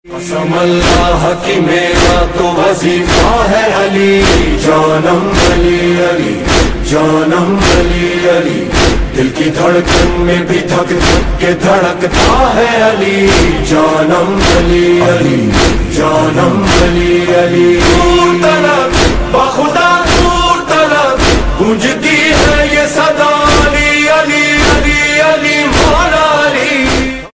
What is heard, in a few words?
noha ringtone